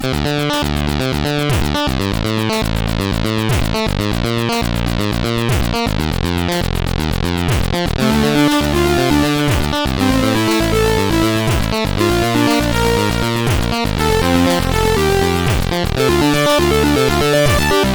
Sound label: Bass Rumble, CSG8580R5